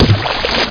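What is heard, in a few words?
splash big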